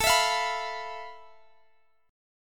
F Major 7th Flat 5th A, guitar chord

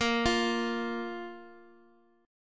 A sharp 5th A sharp Guitar Half Step Down

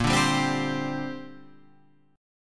Bb 6th Add 9th Bb Guitar Half Step Down

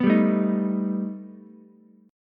B Augmented 7th B down Ukulele; strummed; JGuitar

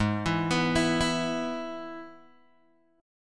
Ab Minor Sharp 5th, Guitar, DADGAD

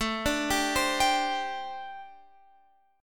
G Suspended 4th A; Gsus4/A arpeggiated

